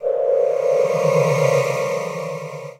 FX UFO sweep; Polyend; Legowelt

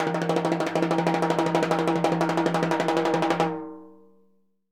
TIMBHI LON, Synclavier Percussion; ILIO; Synclavier Percussion 2; World & Orchestral

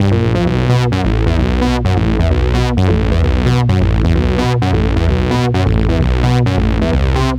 NRG Bass, Trance

